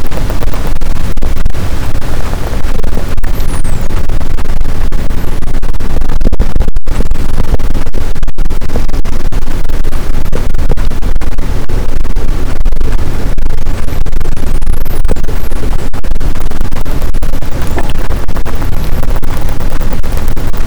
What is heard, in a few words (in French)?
cachalot positif, boat, Physeter Macrocephalus